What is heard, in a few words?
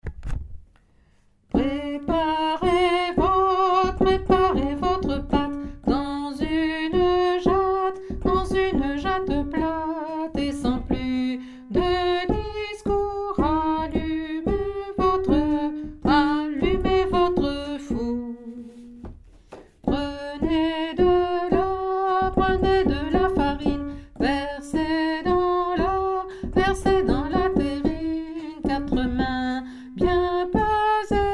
alto; recette pour un cake